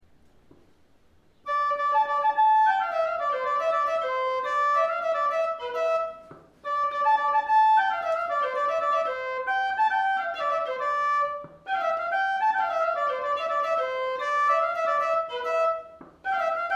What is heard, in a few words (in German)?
Portative